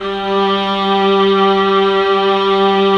viola, 3D Viola Xtreem; E-MU Producer Series Vol. 5 – 3-D Audio Collection; 3DSynths

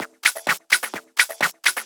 FX Loop 128 BPM, House

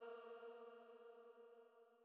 Female Pad A#2

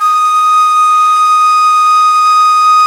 NYE FLUTE; Nye Flutes

